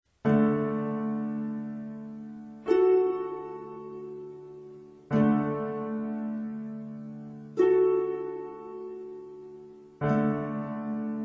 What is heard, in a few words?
Symphony 9 2nd; Dvorak